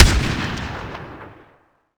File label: imperial carbine 1shot